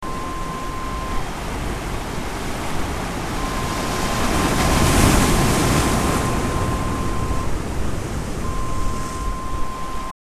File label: wave